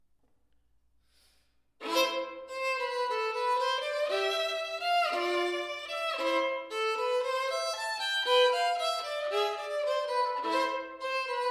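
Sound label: Fiddle Tap Fusion violin only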